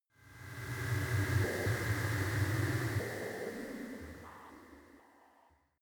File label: spaceship calculator evil; raumschiff